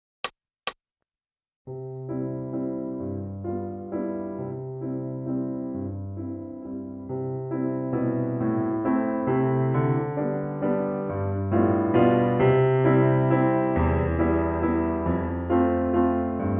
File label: Piano; Sweet Betsy From Pike